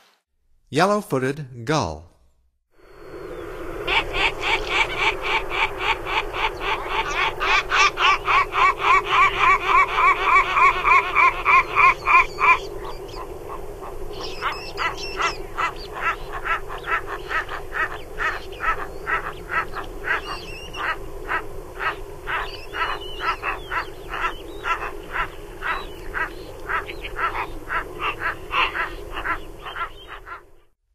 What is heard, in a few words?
Yellow-footed Gull; North America; Stokes